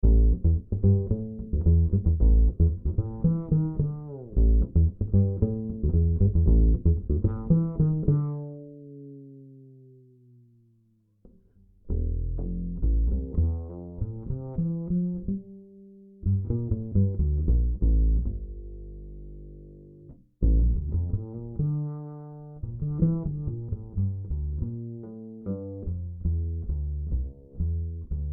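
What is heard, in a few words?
acoustic bass Plucked direct input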